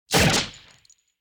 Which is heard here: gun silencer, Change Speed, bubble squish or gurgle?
gun silencer